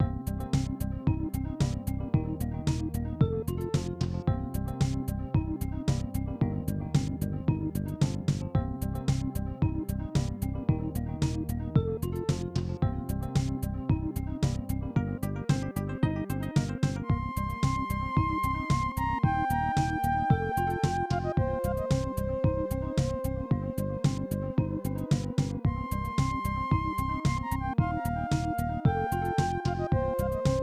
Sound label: Tension; Tenchi Muyo RPG; SNES